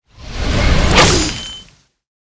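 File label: electric skill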